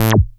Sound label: SHORT ACID, BASSES